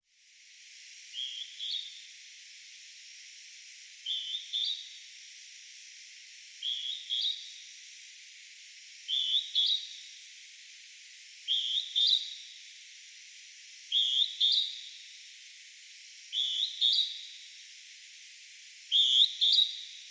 jui, singing

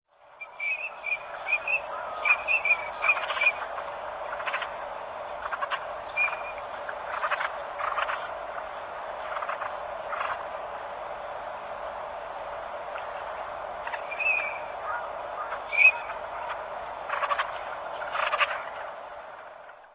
kogamo, singing

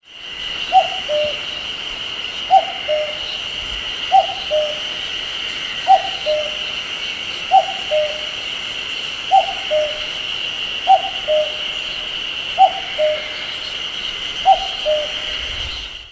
kakko, singing

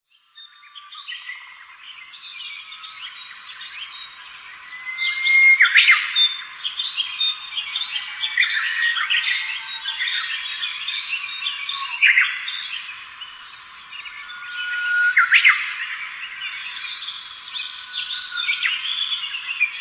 kirifuri, singing

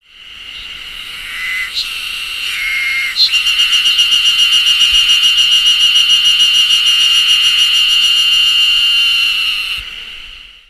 ezohar, singing

